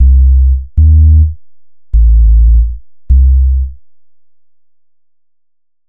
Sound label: Bass, Futurist Drum & Bass